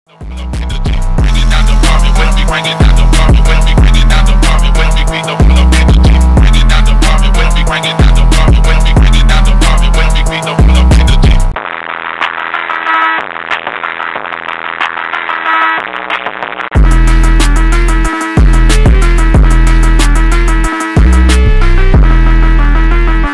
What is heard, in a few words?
phonk